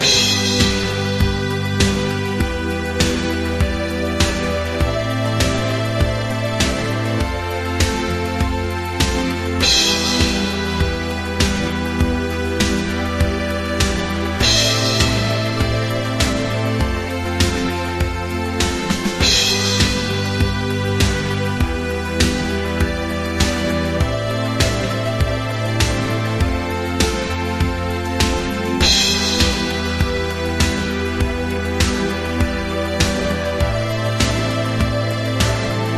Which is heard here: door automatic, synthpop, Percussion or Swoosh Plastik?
synthpop